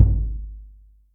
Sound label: BigSofty, Yamaha DTXpress Acoustic Kick; drum machine